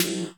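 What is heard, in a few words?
3D snare 808, 3D ambience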